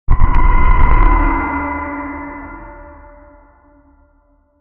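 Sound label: evil laugh layered robotic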